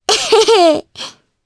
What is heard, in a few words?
Laugh, jp; Requina; kingsraid